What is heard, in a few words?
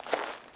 pine land; Golden Crayon Sounds